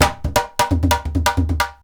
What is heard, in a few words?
IJEILU GROOV; Best Service; World Colours